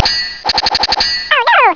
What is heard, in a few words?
kling